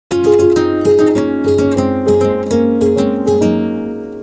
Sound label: depressing rock music